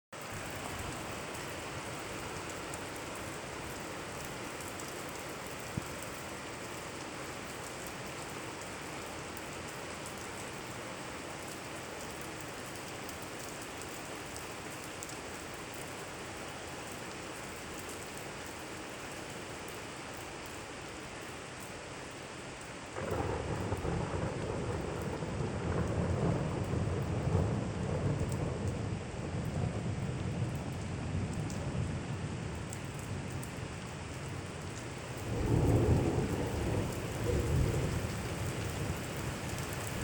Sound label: rainy night relaxation sounds